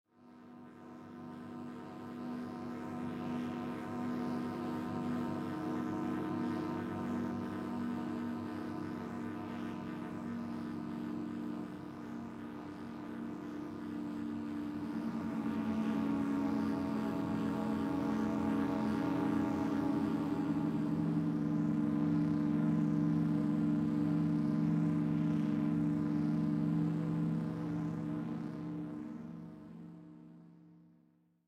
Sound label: AMB Misty Lands Center